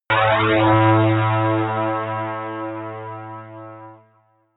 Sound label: synth hit muffled drop high